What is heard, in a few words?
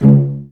CL PIZZ C1; Best Service; Peter Siedlaczek's Advanced Orchestra